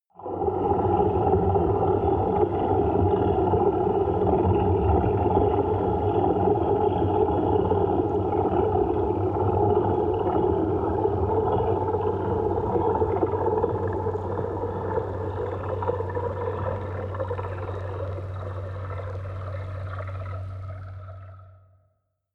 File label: water fx dark